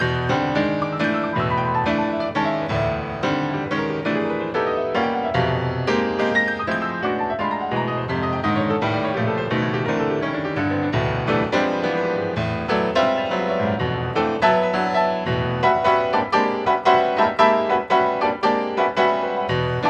mus mega battle pno; stranglehold

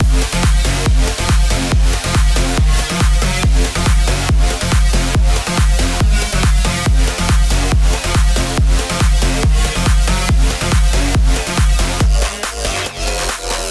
electro; Equalizer

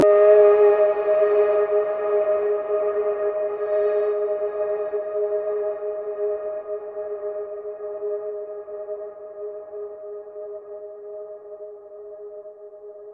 Stinger Exploration Chord

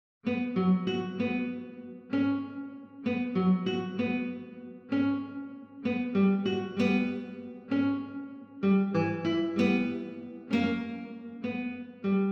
Compass Guitar

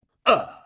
Hit; Russian Life Simulator

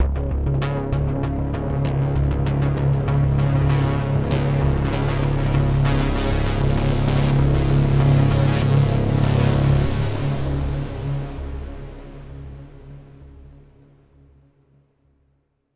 Overlay Dark

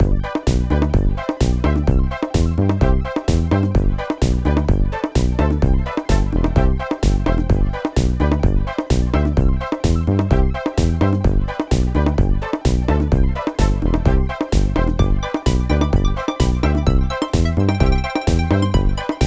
playing; Hoggy2; PS Vita

